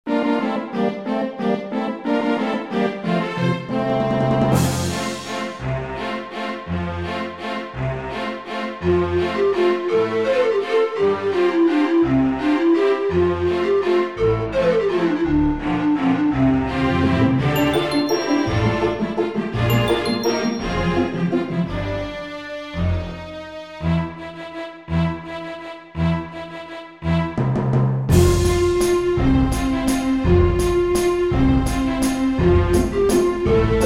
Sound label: Romantic Waltz